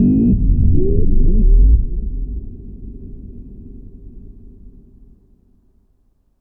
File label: Low Rumble; Jungle Warfare 1&2